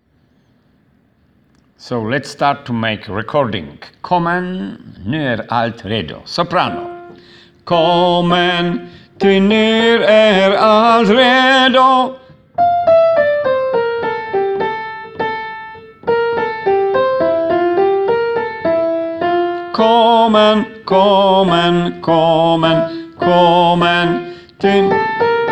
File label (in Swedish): sopran